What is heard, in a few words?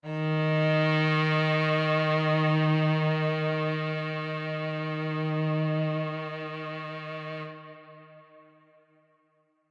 Cello Verb D sharp 2, Verby Solo Cello